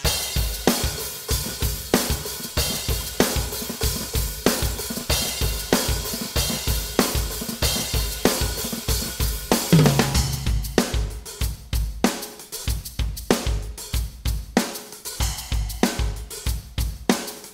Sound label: drums only. Isolated drum track.